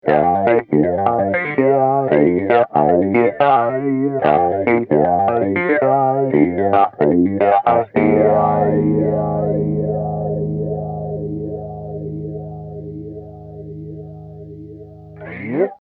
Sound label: formant; mobius